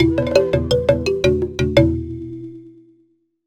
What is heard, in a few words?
Jingle Negativ